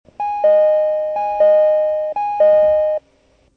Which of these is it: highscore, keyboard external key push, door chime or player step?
door chime